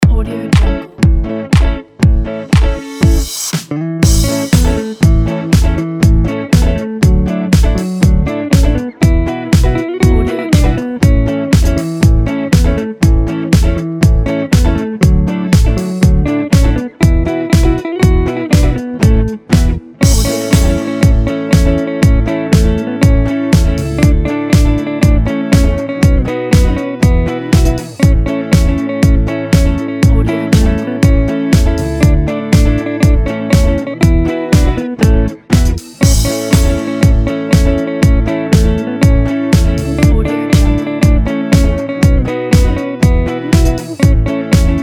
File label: christmas funk; bluewhalemusic